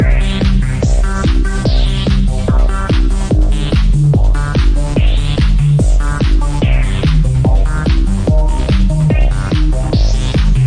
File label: 145 bpm cheeseball trance loop; 141 to 160 bpm